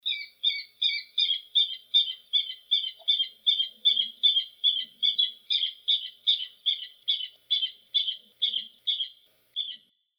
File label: guam rail